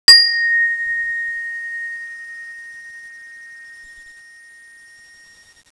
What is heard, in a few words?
chime